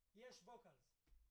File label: BD Out